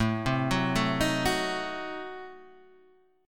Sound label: A Diminished 7th A, Guitar Standard; Adim7 arpeggiated; JGuitar